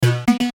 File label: BUTTON RHYTHMIC ROBOTIC; Multimedia; Buttons; Blastwave